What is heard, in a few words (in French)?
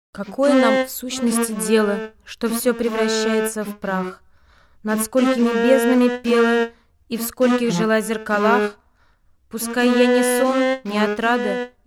voix flute; Akhmatova